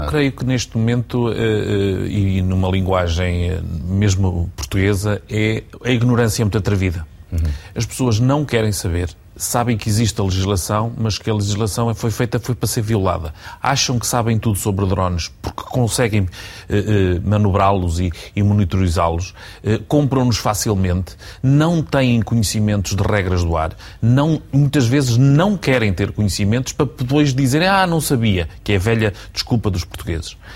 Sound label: Drone